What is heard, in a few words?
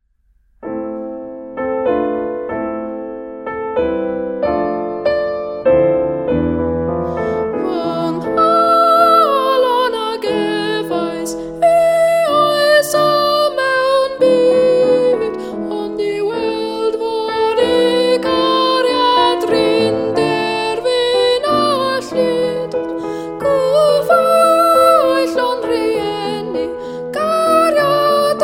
treble piano